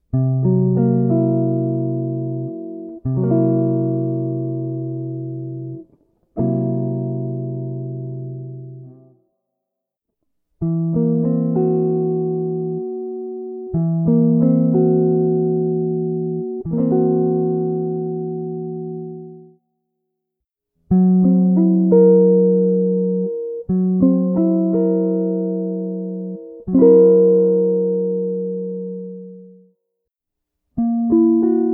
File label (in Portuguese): Inversão de C7b5